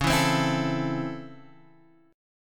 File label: C sharp Minor Major 7th Sharp 5th, Guitar, Half Step Down